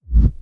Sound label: BD Gate FX, Bassdrums; Vengeance Samples